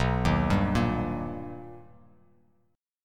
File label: B Augmented Major 7th B Bass Standard, chord; arpeggiated; JGuitar